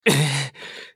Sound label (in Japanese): 被ダメージ; アンドレ